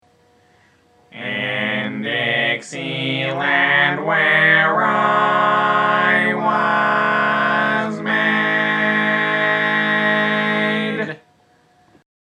robots sing, barbershop multitrack tag overtone experiment robot quartet